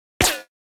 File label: gun silencer; NCH Library